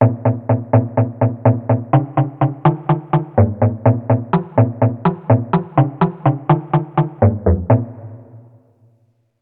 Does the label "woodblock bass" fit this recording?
Yes